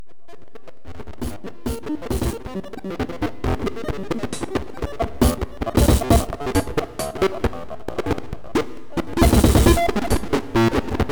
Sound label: distorted superchip; weeklybeats-2020